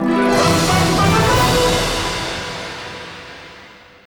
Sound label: 2nd Place Goal Fanfare; crash bandicoot series sound box; Pirates of the Carburetor